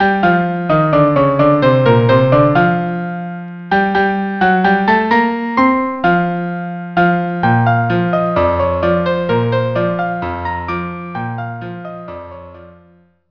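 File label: rag violin, cello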